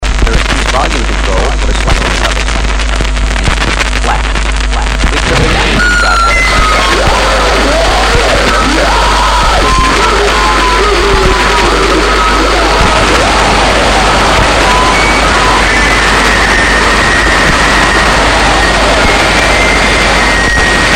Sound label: Gas Drone